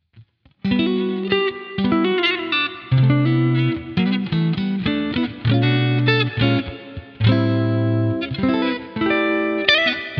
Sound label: Melodic Groove; black vocabulary